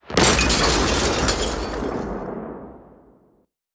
explode; LuckyPunch; G-Star

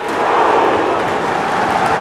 Incorrect; ItsComingHome

